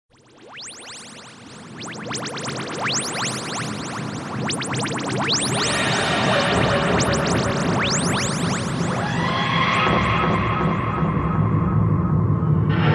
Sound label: Tardis Lands